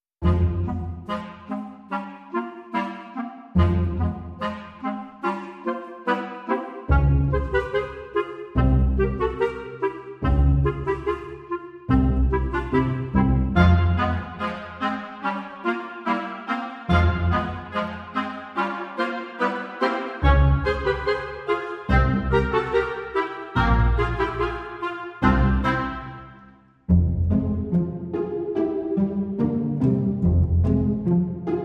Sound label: Doodling Violins, Strings and Woodwinds Only